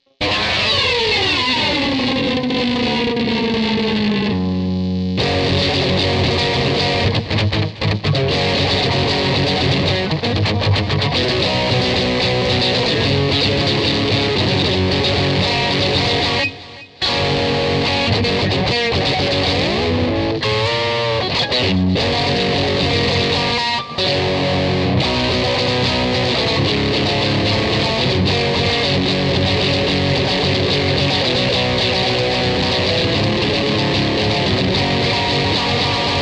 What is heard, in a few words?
electric